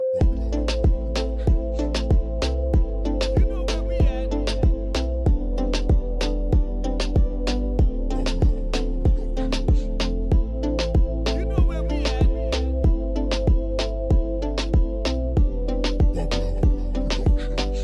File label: Latin Reggaeton